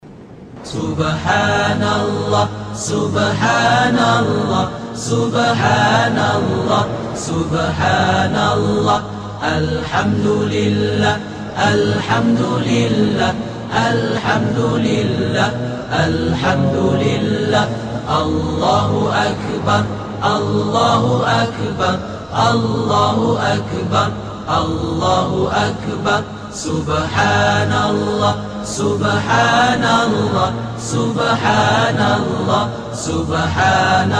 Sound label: subhanallah alhamdulillah allahuakbar nasheed